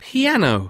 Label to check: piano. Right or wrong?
Right